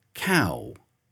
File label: cow